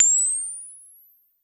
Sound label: U220 FX Set, Drum Machines; Roland U220